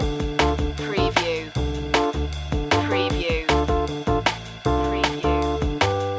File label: jazz pool loop short lofi